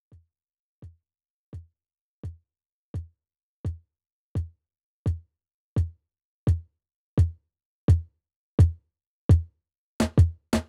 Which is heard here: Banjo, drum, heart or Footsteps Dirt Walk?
drum